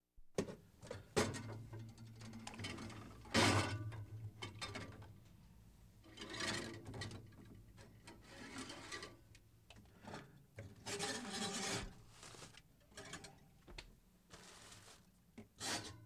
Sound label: Household Phones